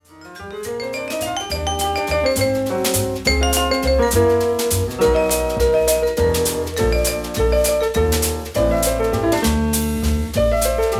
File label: Jazz Quartet